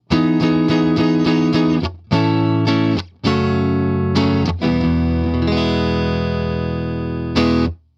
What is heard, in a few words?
Stratocaster Neck